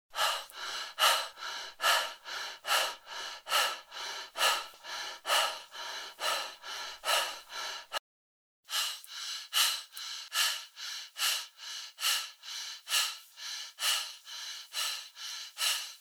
Tieng tho sau khi lam viec nang

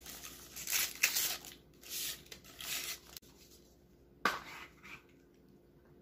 tieng boc va cam ong hut vao ly tra sua

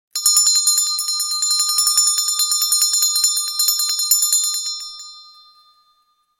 Tieng rung chuong reng reng reng

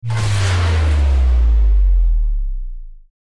Am thanh du hanh thoi gian

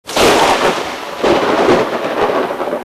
Tieng sam set khi troi bat dau mua

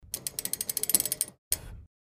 Tieng xich xe dap quay

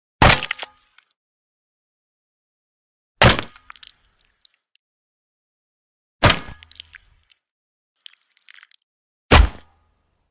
Am thanh chem va am sat

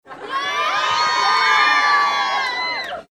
Tieng dam dong nguoi ham mo ho het

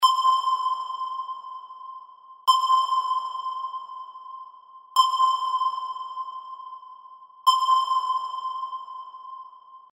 Tiếng bíp từ máy dò tìm radar

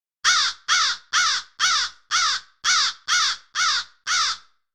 Tieng qua keu

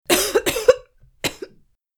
tiếng hò giọng phụ nữ

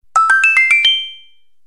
am thanh thong bao 1 up; mario

